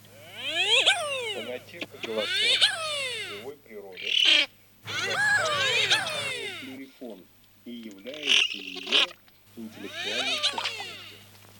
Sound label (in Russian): Красноголовый нырок Moriglione; plurifon